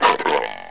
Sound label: LIONROAR; ANIMALS